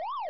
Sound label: siren